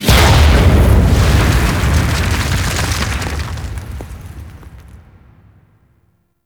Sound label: Crash Site Explosion; Ambience; Gameplay; Warframe; Orokin Moon